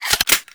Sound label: Gun Reload Weapon, Weapons Foley; SFX Kit